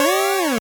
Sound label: 8bit se